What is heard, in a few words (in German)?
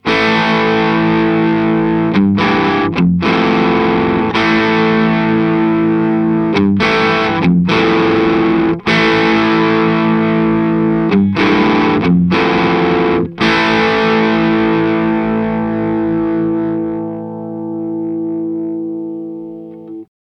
Boss DS 1w Standard Tone 12 Uhr Dist min